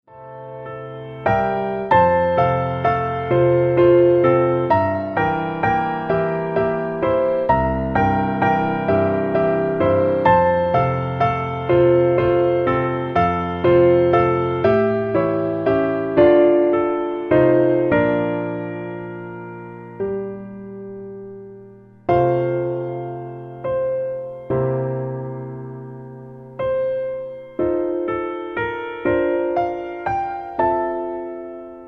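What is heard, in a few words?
In The Garden, Piano Low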